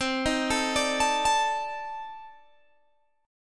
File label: C Minor 6th Add 9th, C, down, Guitar, Open G